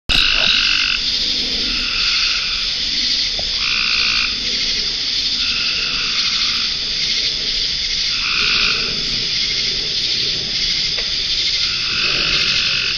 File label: Frogs Crickets Cicadas - Near Pond & Forest; frogs in ponds; venaurafarm; permaculture